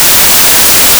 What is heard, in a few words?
noise